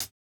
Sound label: hihat, house